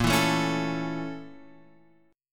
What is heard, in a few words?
Bb Minor Major 7th Flat 5th; BbmM7b5; strummed; x,x,x,3,4,4,3,6; JGuitar